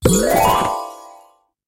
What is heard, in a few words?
Out Chapter Gift Box